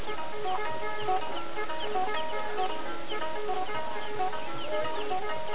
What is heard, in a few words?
valiha; nosykomb